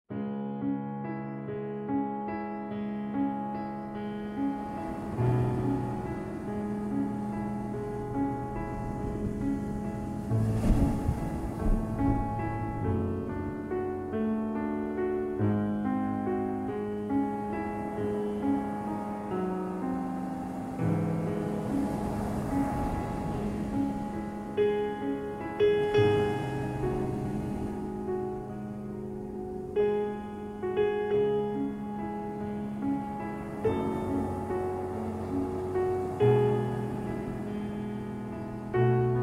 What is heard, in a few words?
Moonlight Sonata with Ocean Waves Music for Deep Sleep